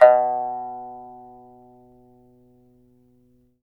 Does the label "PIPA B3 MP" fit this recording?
Yes